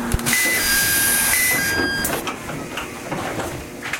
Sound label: dc110 door; tetsuon